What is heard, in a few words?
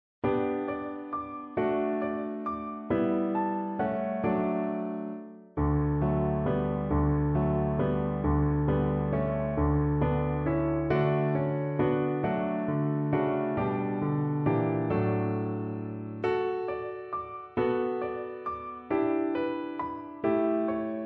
piano solo